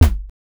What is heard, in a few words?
damage hit